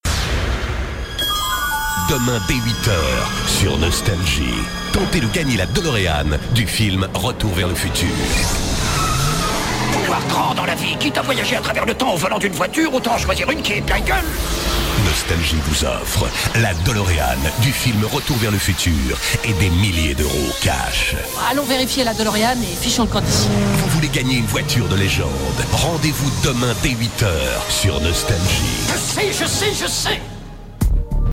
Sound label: jingle; nostalgie